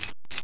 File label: lite swtc; Image Bonanza; Expert Software